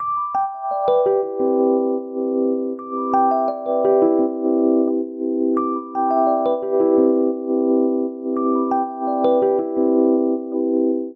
Ostinato Arpeggiated Descending C Major7 86 bpm, Electric Keyboards; MIDI Clips; Ableton; Factory Packs